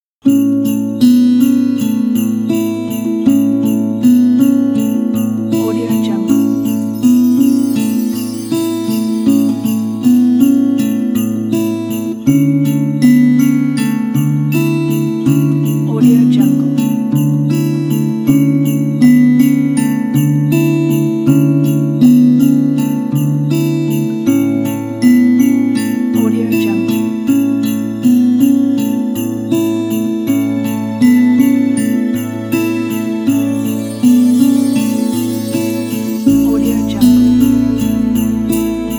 christmas mood

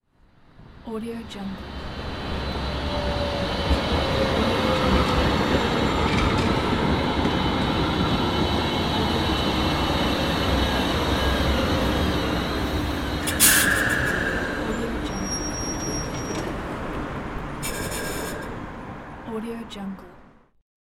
train stopping

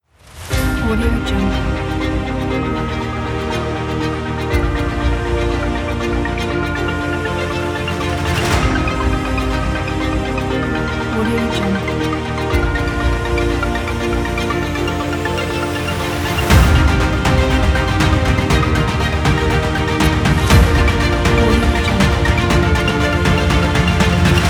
motivation cinematic orchestra; madbirdprod

